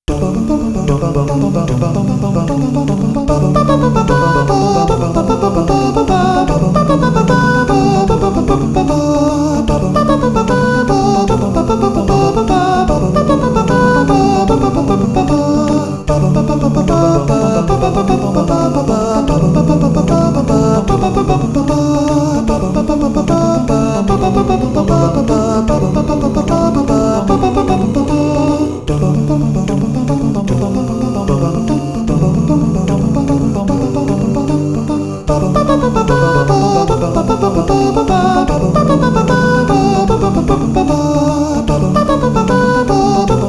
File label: acapella; zelda; majoras mask